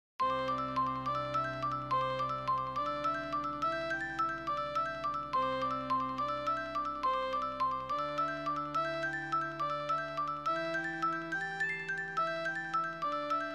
marimba with string quartet

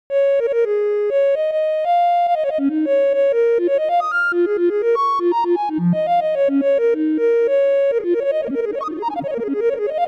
flute pentatonic with reflections

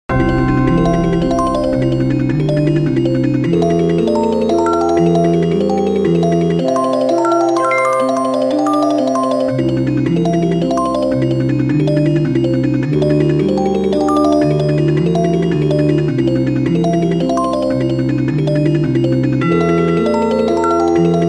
slendro without detuned octaves; fractal music; Sloth canons

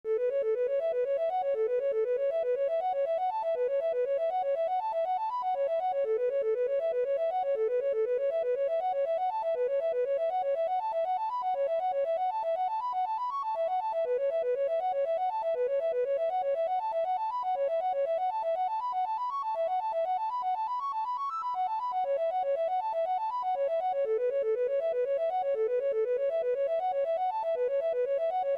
recorder

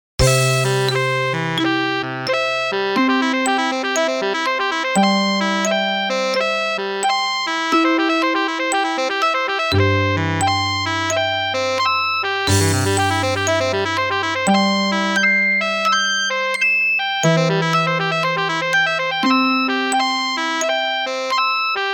transforming major chord; fractal music; Transforming seed

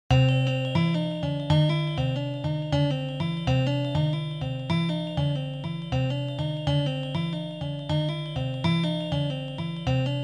tone scape

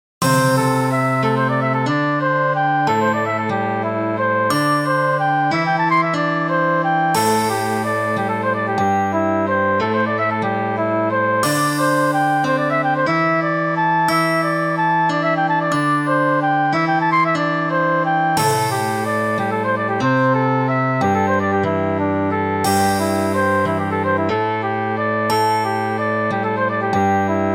golden ratio tune

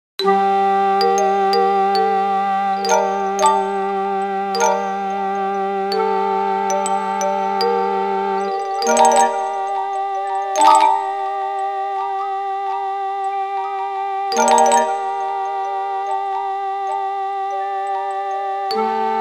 shakuhachi + marimba with rests; fractal music; Sloth canons

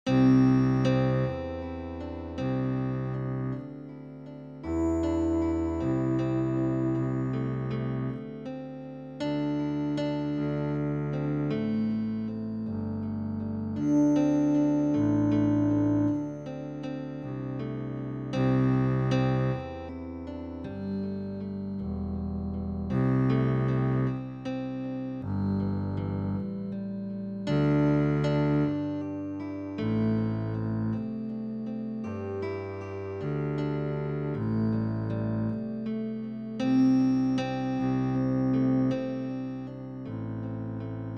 contrabass solo in six eight

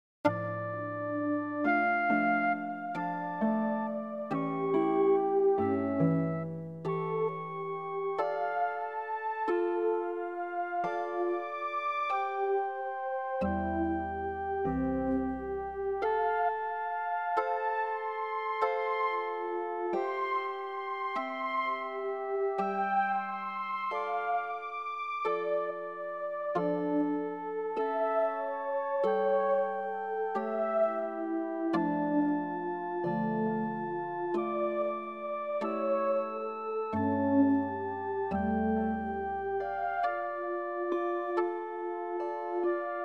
la folia chords

